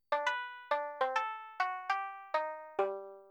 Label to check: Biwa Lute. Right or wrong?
Right